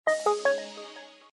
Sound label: Power Down, phonetones EffectSnd